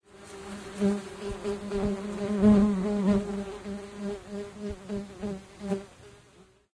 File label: fly